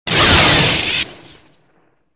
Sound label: green beam hit; movers objects